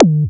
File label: MS-20 FX, E-MU PX-7